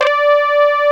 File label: ARP LEAD, Techno Trance; Mostly Leads